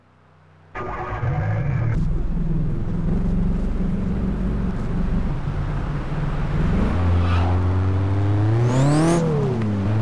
honda civic eg6 si turbo; 1994

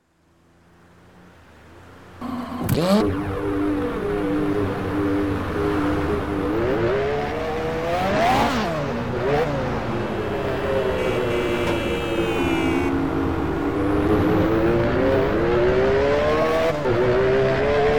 ferrari f430; tdu

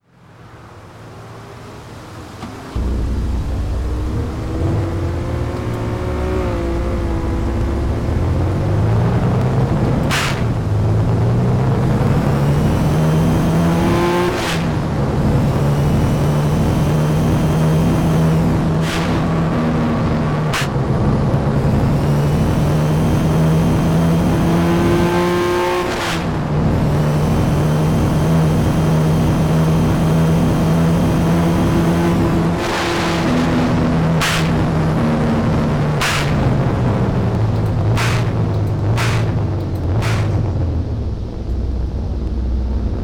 nissan skyline gt-r r33 nismo; TDU-1

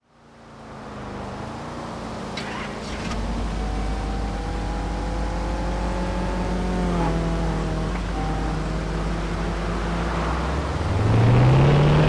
plymouth barracuda formula-s custom; 1968

